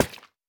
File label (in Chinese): 珊瑚挖掘声